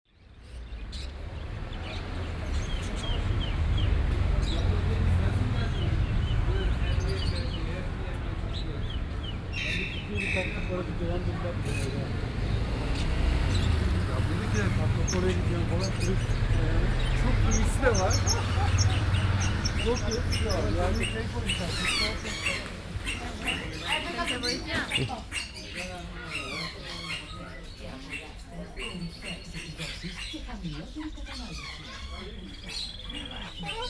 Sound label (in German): vogelgeschaeft